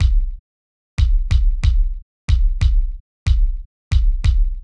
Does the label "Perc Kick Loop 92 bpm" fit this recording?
Yes